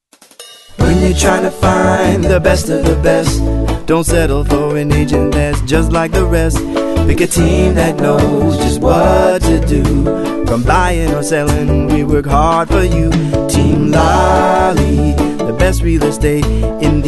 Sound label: Jingle; Team Lally